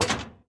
Rocket Bounce; Doodle Jump 2

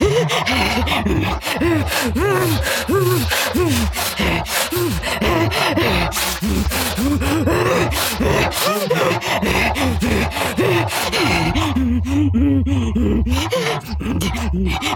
Chase Medium; Devour 2021 gamerip pc macos

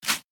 Ranger Scatter Shot Arrow

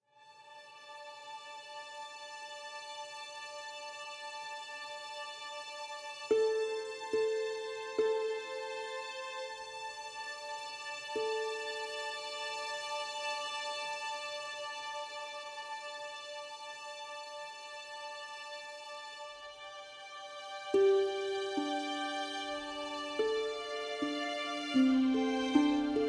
Exploration; The Witcher 3 Wild Hunt; Skellige

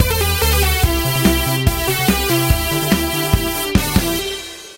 Theme Jingle; A Robot Named Fight

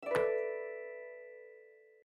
Cross Bomb Awake; my cute commissar 2020 gamerip

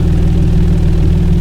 Bmw X5 Idle; gamerip; Car Simulator 2; android

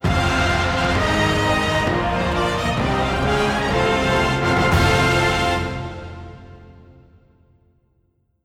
Soul Tree Completed; Xenoblade Chronicles 3

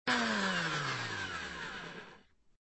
blender stop; papa s freezeria gamerip

